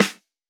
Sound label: hiphop snare, Effect on